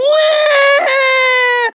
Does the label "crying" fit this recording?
Yes